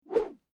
Whoosh Swish Sweep Long Bamboo Cane Low Pitch; High Speed; Staff And Rod; Tom Hutchings

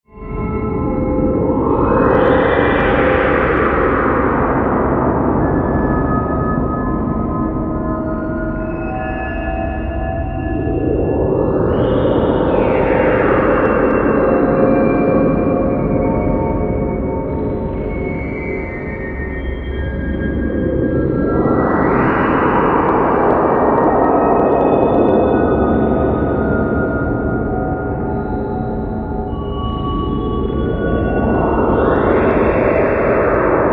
Drone, Fantasy, Vintage, Time, Vortex, Scifi

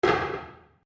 Wood On Wood Floor; Material components for constructing more complex sounds; Sound Effects:Materials

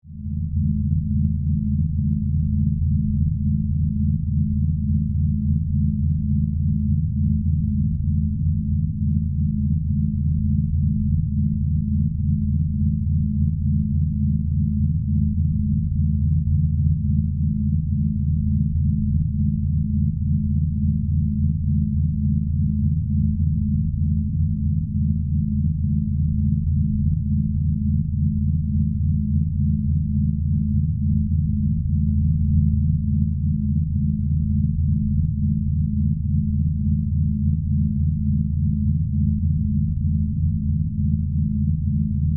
Spaceship Drone, Hum, Flutter; Science, Fiction; Spacecraft; BlastWave

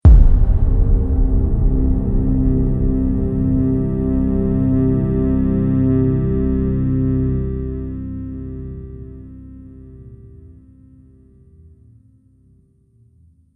Dark Doom Trailer Hit; Trailer Sound Effects

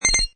Confirmed, Button sound or menu sound; Multimedia

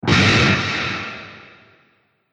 Scream Total Distortion; Screaming Sound Effects

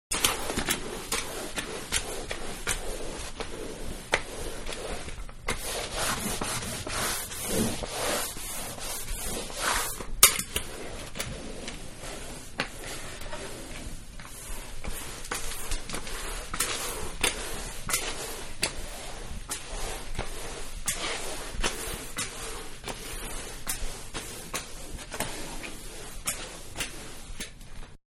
Mopping Floor; Cleaning; Household; Manhattan Production Music

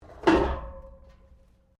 PIPES METAL IMPACT METAL; Metal (Sound Effects:Materials)

